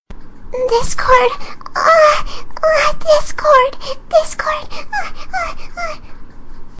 moaning; Vocaroo; Fluttershy

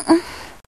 Moan

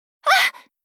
VOX Get Hit; Li Li; Heroes of the Storm; warcraft

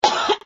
Cough; Lewd SFX

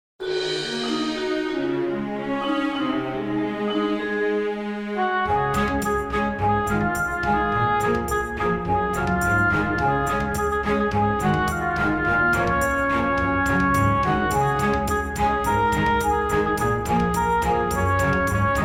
Orchestral Remix

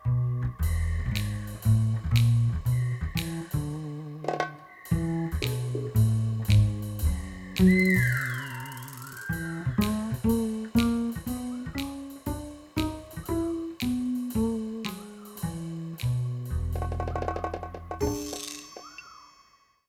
Reprise (Instrumental); My Little Pony OST Repository; Season 1; Bonus Music

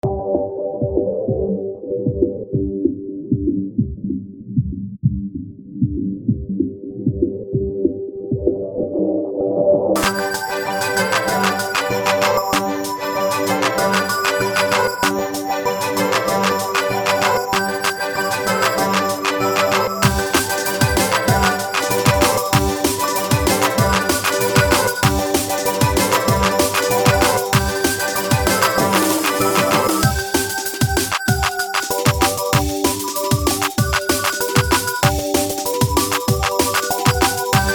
dnb and strings

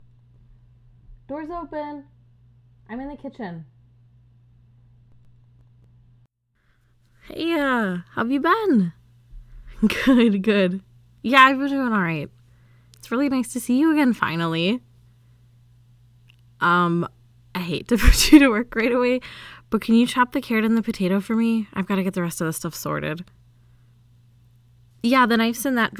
From Stuttering to Screaming

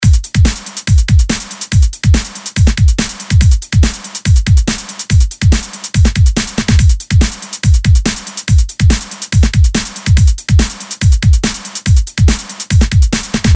Breakbeat Line, 142 BPM

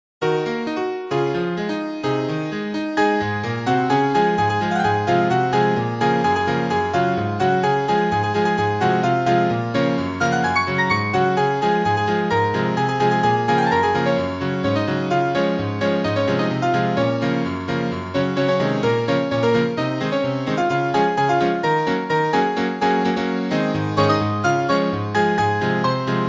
Ragtime Remix

